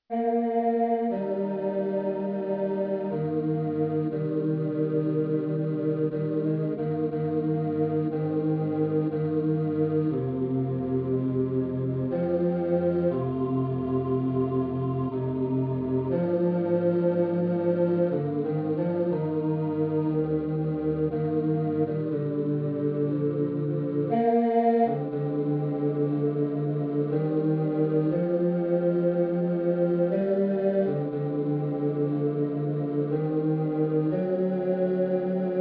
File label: Baritone Pilgrims Chorus